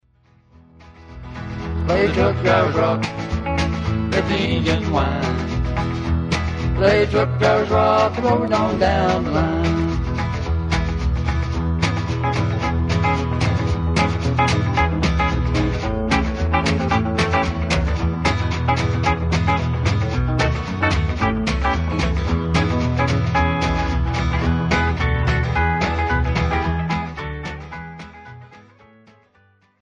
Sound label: Truck Driver's Rock